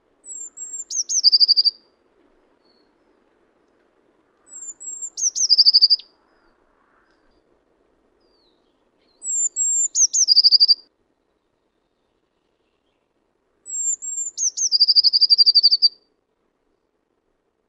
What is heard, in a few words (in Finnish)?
kuuntele sinitiaisen laulua